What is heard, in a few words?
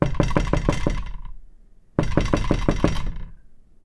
knock on glass door; knocking